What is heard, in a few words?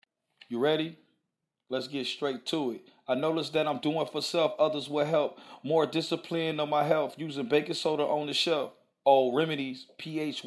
hip hop 129 Em; acapella; Introduction